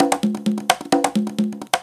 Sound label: Live Percussion, 130 BPM